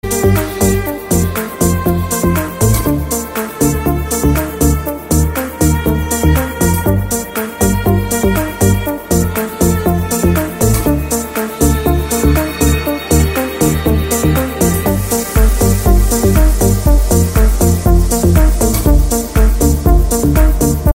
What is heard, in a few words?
arabic ringtone